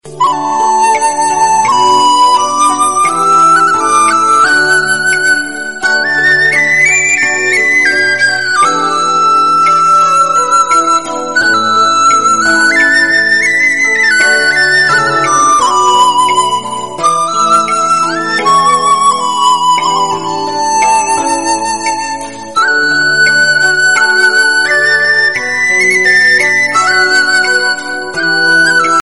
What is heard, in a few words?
japanese tune